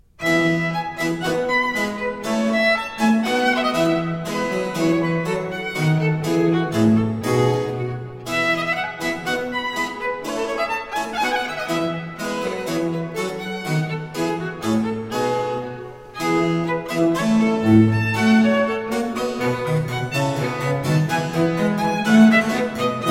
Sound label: Cantata for alto 2 violins continuo Allegro; Medea in Corinto; Antonio Caldara